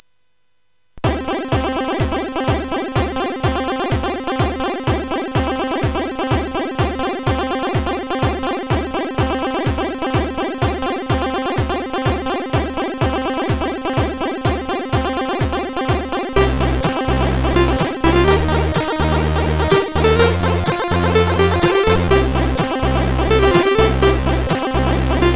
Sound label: Intro, CSG8580R5; X-ample; Architect; hvsc